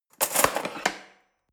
cutlery fork drawer handle scrape

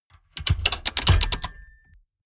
mechanics medium rattle bell

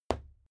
fight impact sweetener tiny

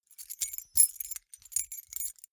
key ring small rattle hard

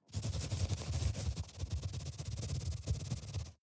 rat movement fast scrape

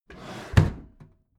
packed drawer smooth clatter close heavy

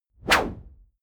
whoosh noise sweep hit short

